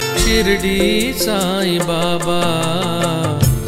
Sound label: ringtone; shirdi sai baba